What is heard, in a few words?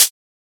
Closed Hihat; Ghosthack - Free Techno Drum Shots 2024